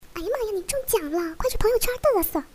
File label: winning